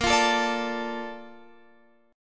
A sharp Major 7th, Guitar Open F; strummed

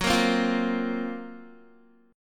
Gb Major 7th Flat 5th Guitar

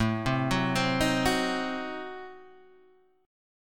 A Diminished 7th A down Guitar Open G

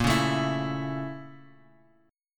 Bb Minor Major 7th Flat 5th, Guitar, Open G

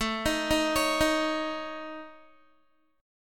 A Major Flat 5th A Guitar, chord; AMb5 arpeggiated

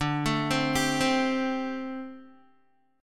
D 7th Suspended 4th D Guitar; arpeggiated; JGuitar

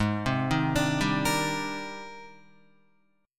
Ab Major Sharp 11th Ab down Guitar; AbM#11 arpeggiated; JGuitar

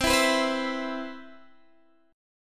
C Major 7th, Guitar DADGAD

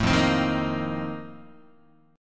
F 11th F, down, Guitar; F11 strummed

